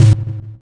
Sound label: damage